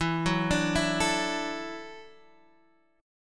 E 7th Suspended 2nd Suspended 4th, down, Guitar, Standard; E7sus2sus4 arpeggiated; JGuitar